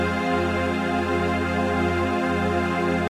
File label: fa minor, playback